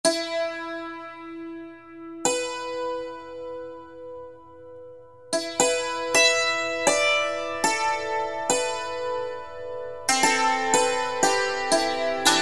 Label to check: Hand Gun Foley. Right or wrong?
Wrong